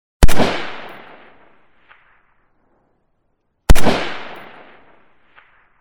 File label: 2 Ruger Mini .14 Shots; SOUND EFFECTS1